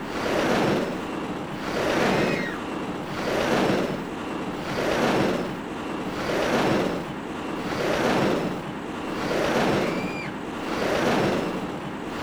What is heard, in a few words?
Roller Coaster (Fast); Kakyuusei; Saturn